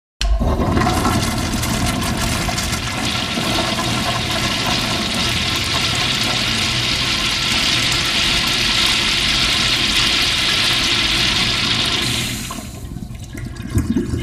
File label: toilet; WC